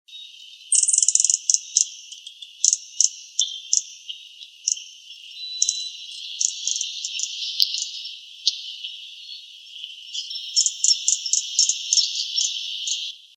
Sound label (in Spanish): Picaflor bronceado